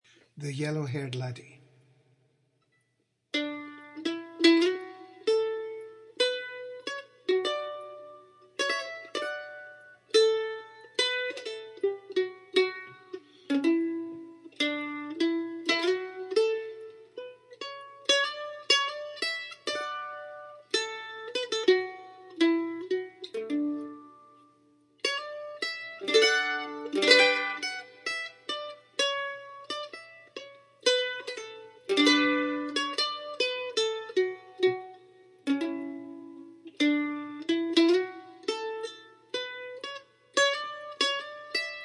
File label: Mandolin